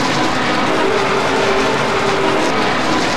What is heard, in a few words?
Door Large Metal Loop; Doors